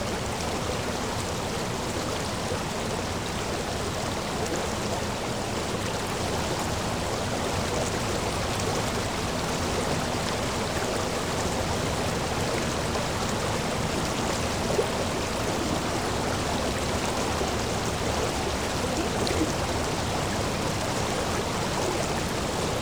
river, ambiances

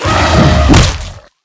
trunk, enemy, combat; baurg

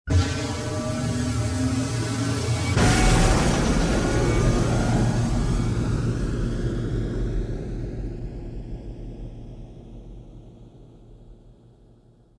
asteroid launch, ships; CosmicRage

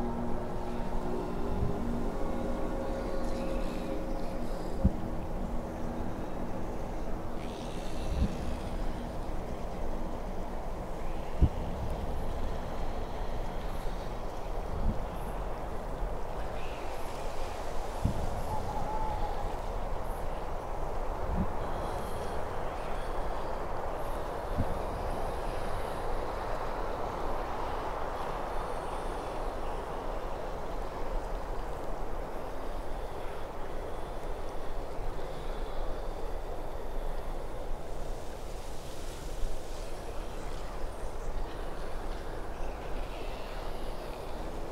spooky, ambiances

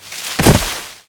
sale; hunt

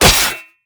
metal, smallclaw combat abilities

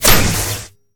metal; combat; weapons; default shootable; larkan sound improvements

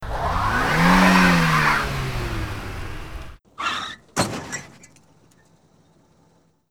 baby

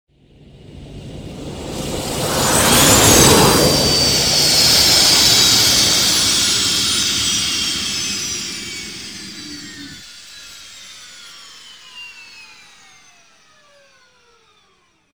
other landing, ships; CosmicRage

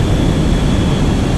jet, ambiances

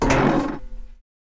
breach; games; CosmicRage

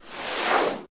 laser, combat suit

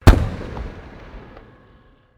fire; combat

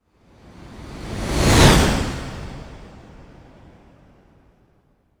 exit rift, ships

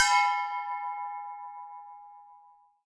auction bell; CosmicRage

